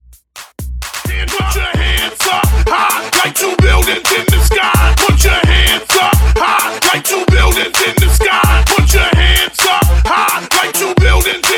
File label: Remastered Hype Dj Intro; 130 BPM